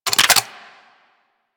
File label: Sniper Reload; Warframe; Tenno